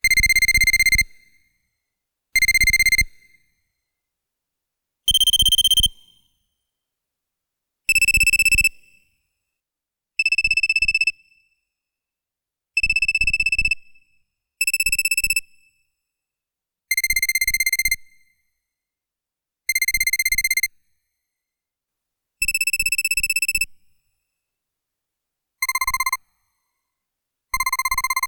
Typing Text On Screen

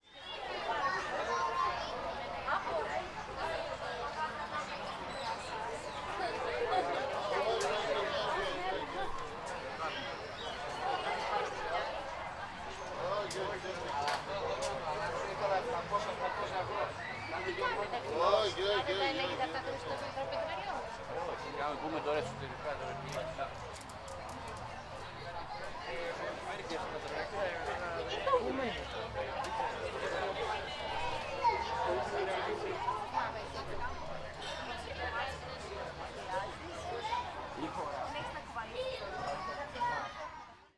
Ambience Bar No Music

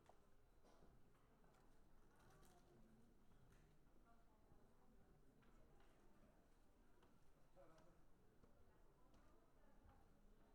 Omni Ambiental Library

